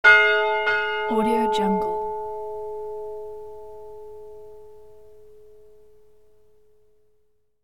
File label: single ring from old church bell